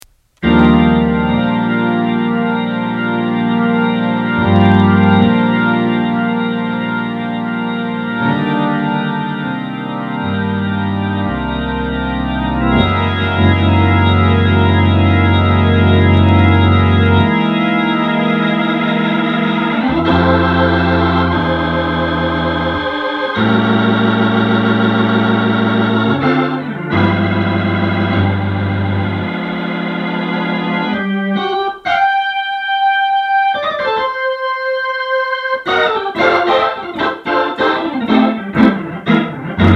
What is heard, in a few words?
XK-2, Hammond; Pierre Sward